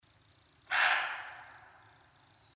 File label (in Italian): Capriolo